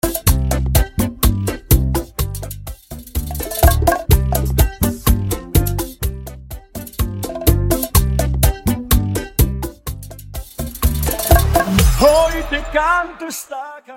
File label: intro acapella 125bpm; Prodeejay Remix